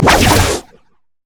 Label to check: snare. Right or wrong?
Wrong